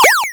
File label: enemy shoot; sky knight